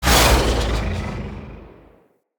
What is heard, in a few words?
Dodge Left, ship; Vehicles; RailJack